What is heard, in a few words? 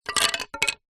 foley wood pieces thin small light drop; zapsplat